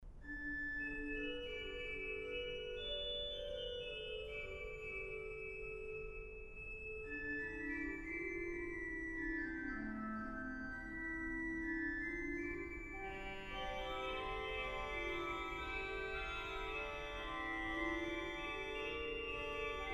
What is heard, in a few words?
Fugue